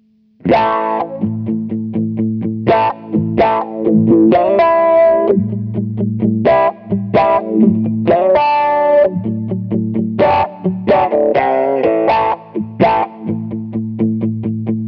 Auto Quack Comp on Crunch Rhythm

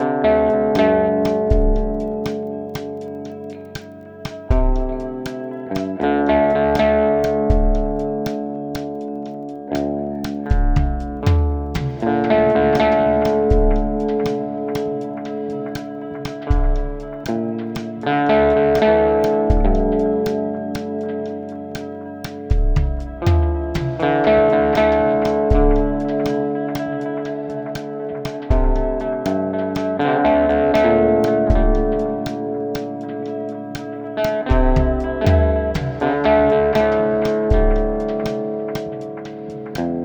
TC Electronic Plethora

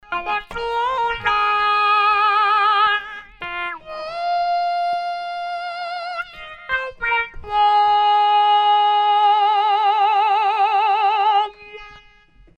Dunlop MXR M222 Talkbox musikerplattform

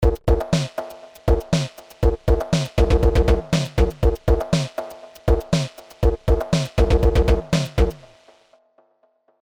CMI V Beats ROTARY CLS-222 Metal Rotors

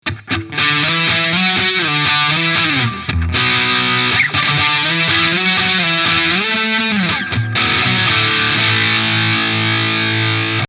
Custom 100 full gain

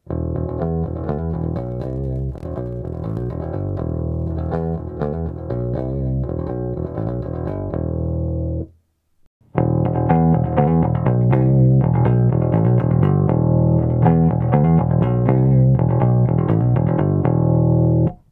Comp Pick

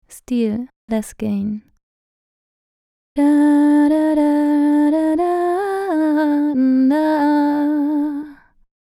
SH Gama Steel less Gain

